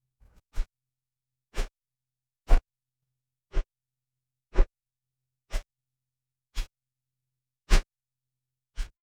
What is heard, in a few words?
gentle left pan swipe; motion